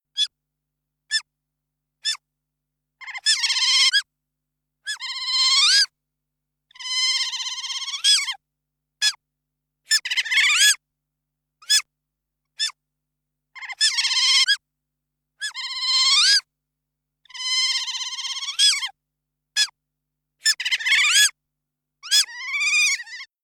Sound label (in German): Steinmarder Jungtier; Baum- und Steinmarder; Ranz, Fortpflanzung